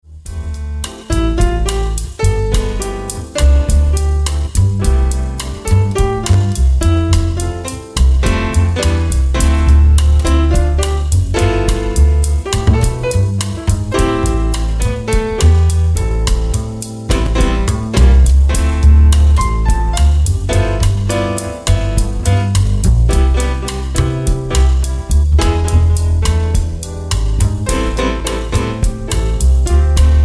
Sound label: Dueling Keyboards